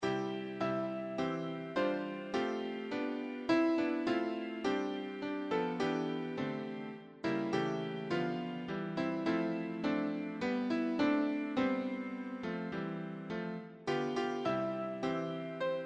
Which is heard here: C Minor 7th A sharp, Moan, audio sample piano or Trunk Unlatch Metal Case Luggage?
audio sample piano